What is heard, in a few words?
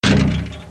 boing